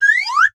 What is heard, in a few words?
comedy whistle slide up; sound effects one